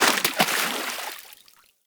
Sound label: Forest Fish Jump Water; Props; Warframe; Grineer Forest